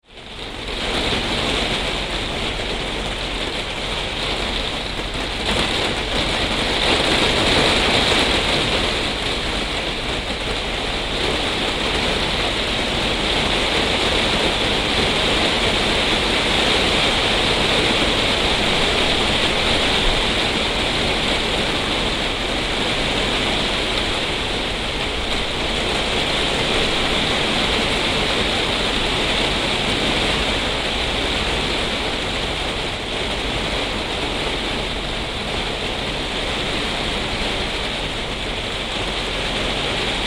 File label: Rain Very Heavy Gusts onto Conservator, Interior; heavy rain showers; nice drips and splats; Rain Sound Effects